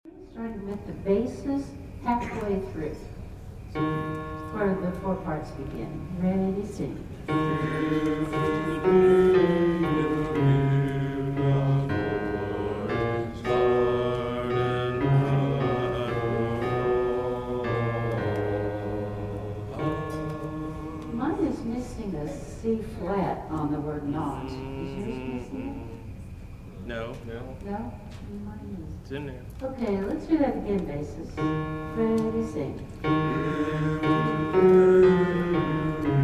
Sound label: Psalm 95 If Today You Hear God's Voice bass